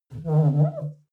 clean window paper towel slow whimper

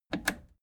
plastic button scratch click disable fast

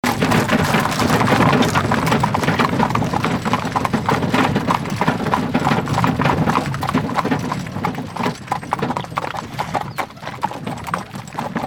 horse carriage slowing down

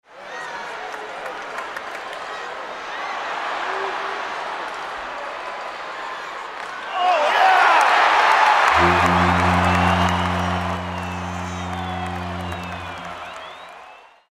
stadium atmo goal

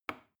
plastic button small push regular

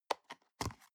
plastic box food small open soft

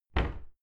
cupboard close normal

